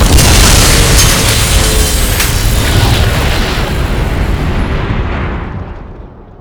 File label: omega explode